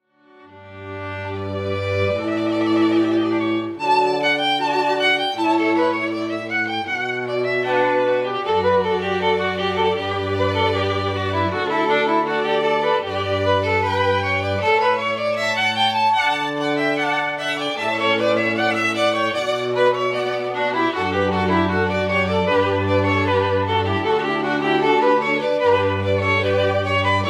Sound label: Pachelbell